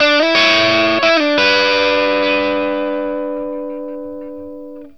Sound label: BLUESY E 60, Vintage Blues Guitar; USB Soundscan vol.22